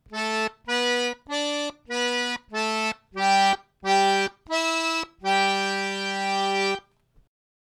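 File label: right hand licks, Phrase seven; Back Door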